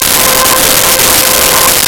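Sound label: Synth Strings C5; Nintendo; July 2020 Gigaleak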